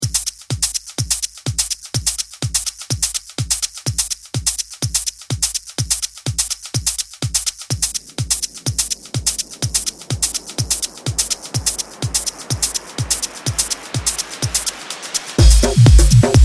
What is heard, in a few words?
Beat Loops